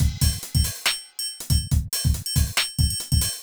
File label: r and b 140